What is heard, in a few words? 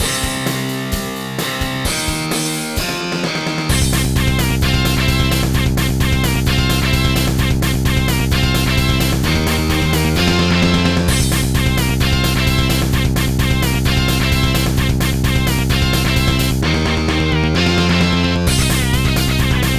Rock Music; pinky street kira kira music night pop town gamerip; at Rocking Bell